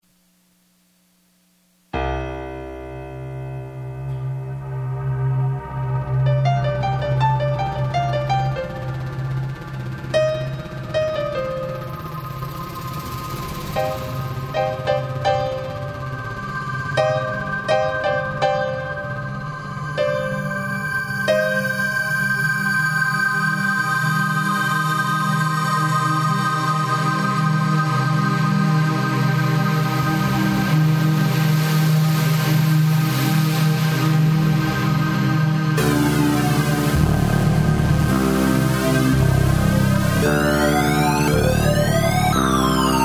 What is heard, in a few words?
sweet instrumental